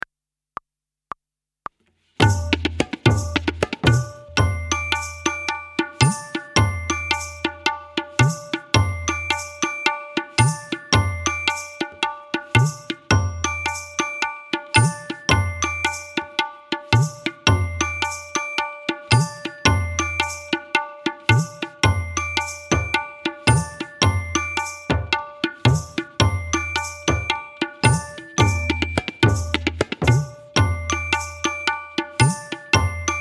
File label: Group Piece with tabla